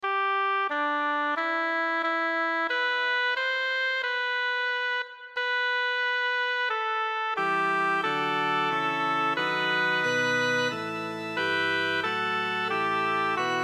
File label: sopran, ave maria; victoria tomas luis de